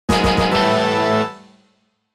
Power up retro achievement mobile game; level up

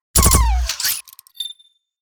Blaster futuristic weapon semi automatic burst

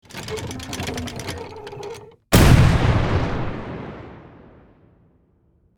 Pirate ship cannon crank and fire

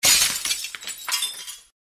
Window breaking into shards

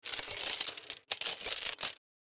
Metallic chains rattle and movements

